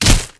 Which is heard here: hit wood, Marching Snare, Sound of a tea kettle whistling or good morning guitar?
hit wood